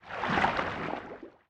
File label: water under emerge; sobek; smite